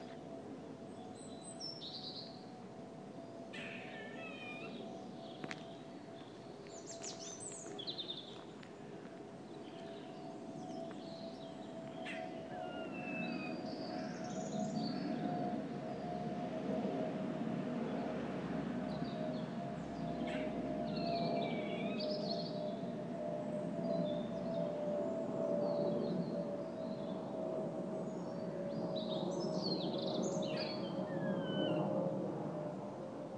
weird squirrel noise

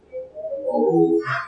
jack in the box music

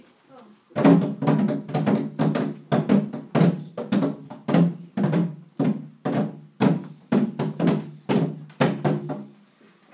drumming compositions; lcs group 3

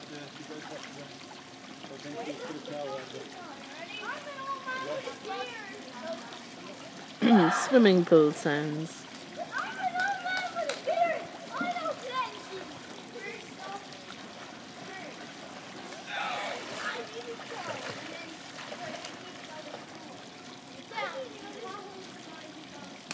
pool sounds

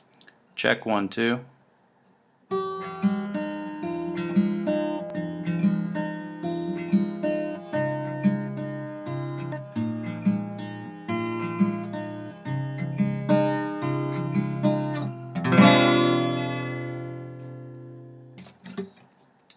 guitar check

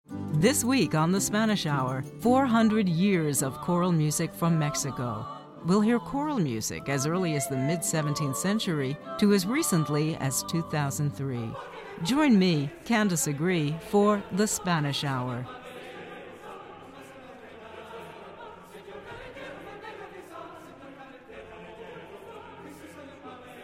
choral music from mexico; on the Spanish Hour; KVNO; KWAX

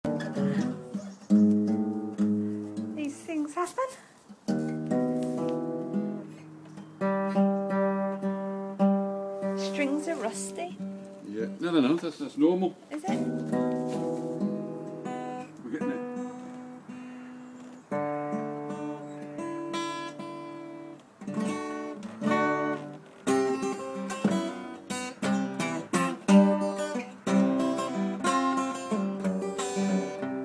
stranger playing my guitar

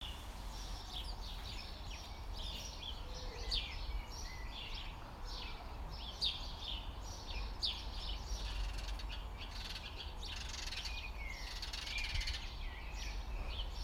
garden birds

